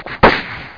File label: BACKFIRE; wacky